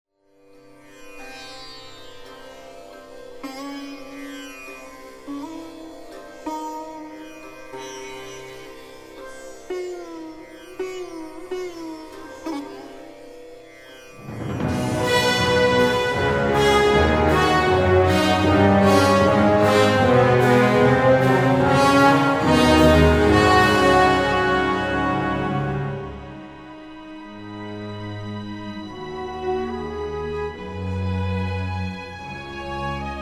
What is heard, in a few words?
Music; Age of Empires III; cinematics